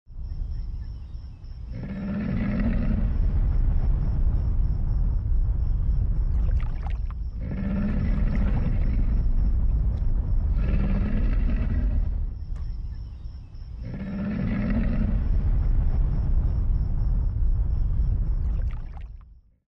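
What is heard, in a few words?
mating call of alligator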